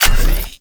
Arch Dual Pistol Fully Charged; Warframe; Archwing

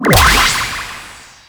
Teleport End; Warframe; Captain Vor

